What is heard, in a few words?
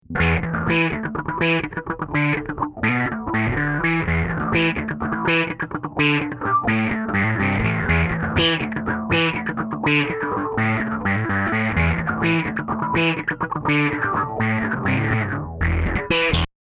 Ibanez, bass, effector